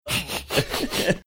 laughing like muttley

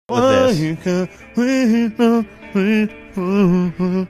mumble singing

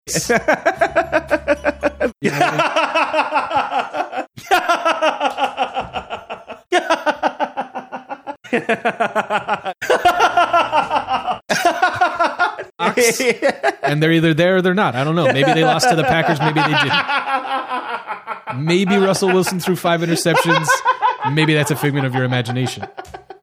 laughing compilation

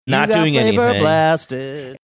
singing

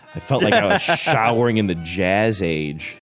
showering jazz age

